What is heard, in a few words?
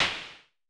SN Low Punch, Vermona Mono15 Drums Snare